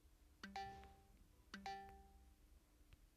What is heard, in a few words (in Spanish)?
tonos